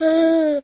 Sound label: Baby: Happy Noises And Coos